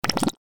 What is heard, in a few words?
liquid event